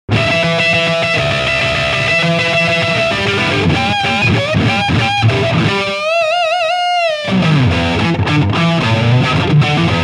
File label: Neck PU Hughes Kettner Triamp MK3 Marshall 412 300 Watt Shure SM57